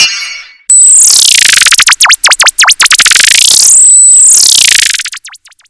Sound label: Oppo sms ringtone; Reno5 Pro